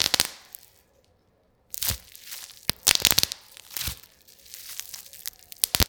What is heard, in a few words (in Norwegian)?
spraking